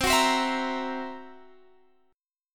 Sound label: Gb 7th Flat 5th C